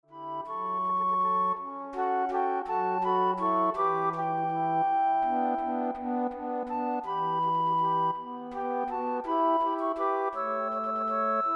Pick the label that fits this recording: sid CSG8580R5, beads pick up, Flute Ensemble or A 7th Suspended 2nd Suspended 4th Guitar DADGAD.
Flute Ensemble